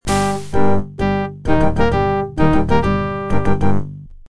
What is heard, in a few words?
miditest adlib